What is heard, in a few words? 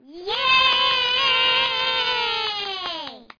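kids yay; Microsoft Graphics Studio Greetings; Microsoft 1998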